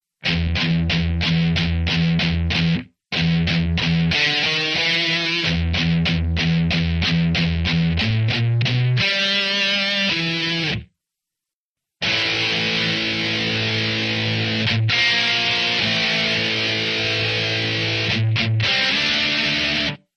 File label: Rock guitar; Total Rock gt